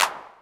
Dry Clap; Digital Sound Factory Xtreme Lead

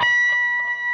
PRS C, Guitars, LEAD

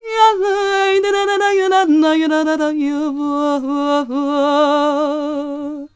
MEH1 VOX, Balkanish Traditions

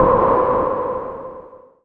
Synths Root C

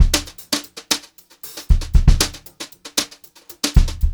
beat, Brushed Drum Loops; USB Soundscan vol.39; AKAI; 90 s Sample CDs; 116 HOTROD

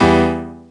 chord piano Em, Chord Shots, Chicago House; Classic Chicago House

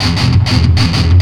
Riff, Industrial; Nasty

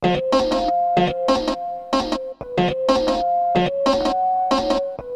Melody, Hip-Hop

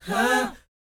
BOYZ, Vocal, R&B Dance; Spectrasonics Vocal Planet

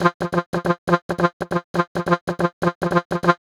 Harmonium, Cinematic Funk Urban; Sonic Foundry (Sony Creative Software); Cinematic Funk Urban Tone Poems

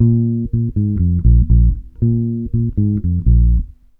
POP B, Bass Grooves; USB Soundscan vol.30; AKAI; POPWHA

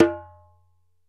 ASHIKO, African Drum

